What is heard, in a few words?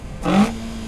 catch; ff2; ae86